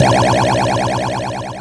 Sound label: Driiinng